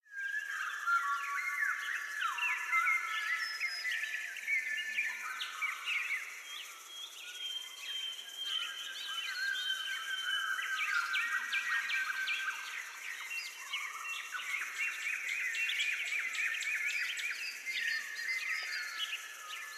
Forest Day Atmosphere - Various Birds Song, Crickets; Hollywood Edge; sound designer's toolkit v2